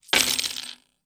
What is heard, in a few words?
coins table